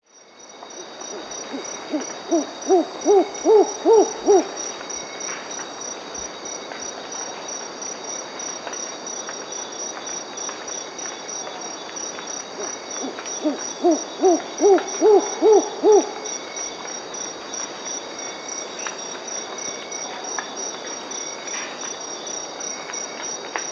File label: Mottled Owl (Ciccaba virgata), Peru; S. America